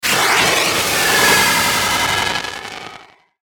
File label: Static Warble Pan Sweeper, Sweeper Warble Flange; Production Element Imaging Element Accent Transition; Blastwave; Static Sound Effects